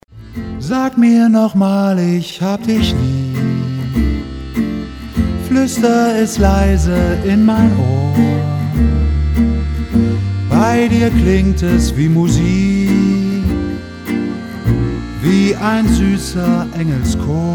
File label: lullaby